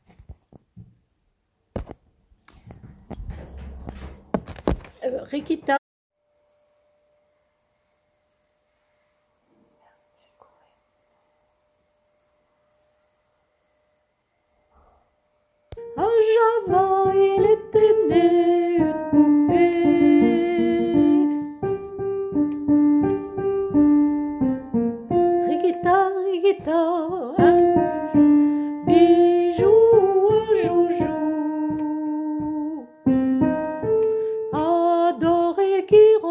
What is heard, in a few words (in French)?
soprane couplet et refrain